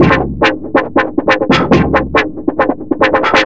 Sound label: LOOP FX, Big Beat Drumloops